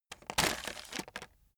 chocolates take hard

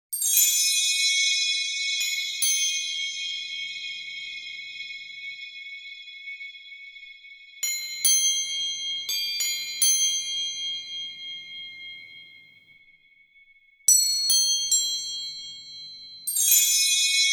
chimes atmosphere

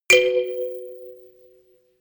notification kalimba rattle fourth

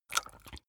swallow food

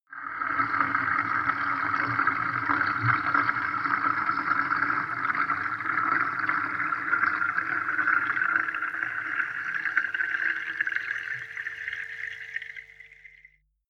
bathtub underwater fill soft

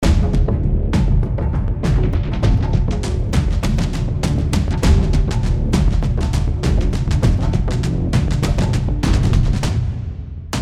big drums

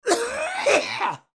coughing

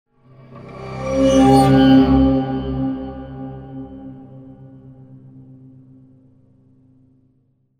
mystery accent

